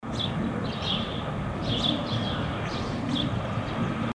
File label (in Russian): zvuki ptic